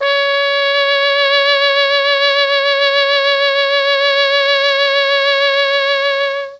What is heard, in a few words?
C#4 TRMB TRMP, Mellotron; Mike Pinder - Mellotron Archive CD; TROMB+TRMPT